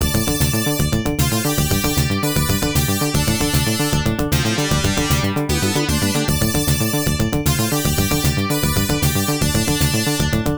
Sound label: Stage BGM; Destroy Them All; Stage 6 BGM Type-B